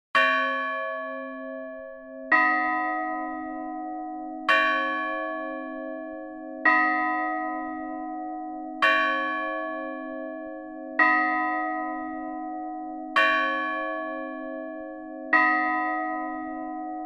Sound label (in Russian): zvon